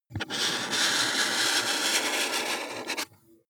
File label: marker mark soft long